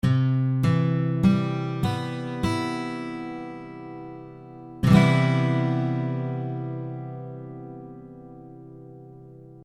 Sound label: Gt C maj